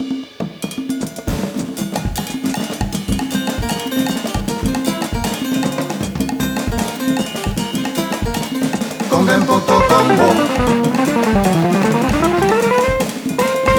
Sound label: CONGA PONTO COMBO; distrishow